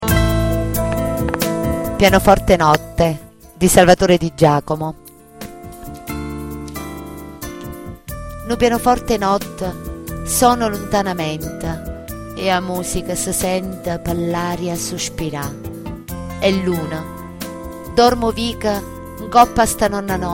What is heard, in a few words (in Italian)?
pianoforte